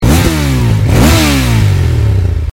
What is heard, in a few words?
Ducati Diavel